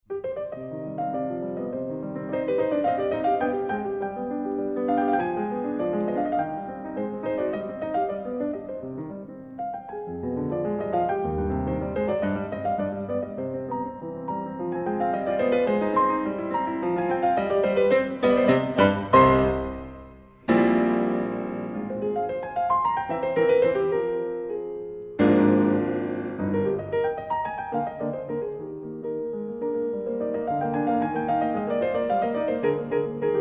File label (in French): sonate piano; n8; beethoven